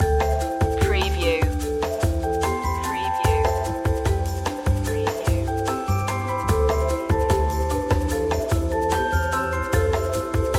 flying home loop

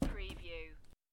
Playing Cards Tap Deck Of Cards On Felt

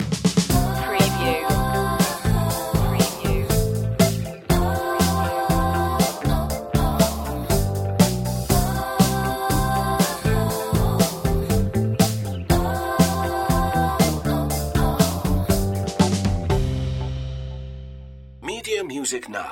joyful pop stinger

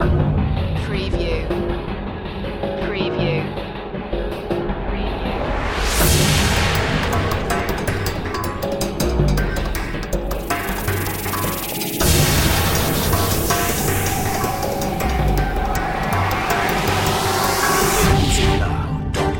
metallic wasteland loop; Soundtrack / Action / Chase

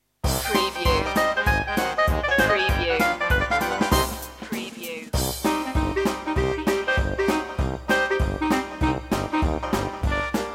dixieland mist

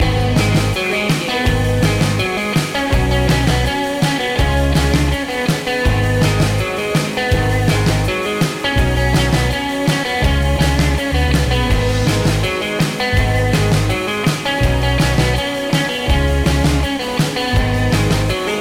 breakin waves loop

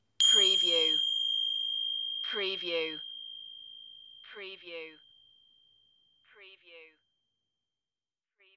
Tibetan Bell

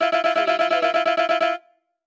Casino Electronic Sound Payout

